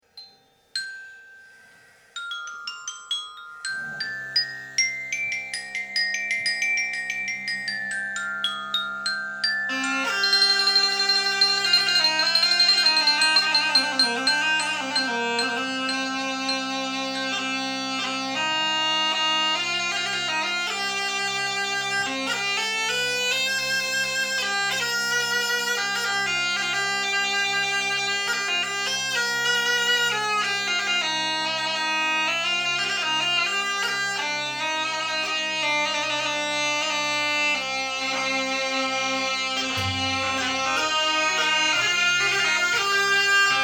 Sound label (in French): Cornemuses; Cornemuses Alchimiques; Castel